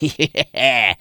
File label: Laugh; Harvest Massive Encounter